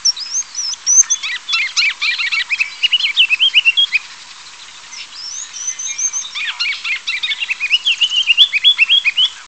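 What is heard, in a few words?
ruby-crowned kinglet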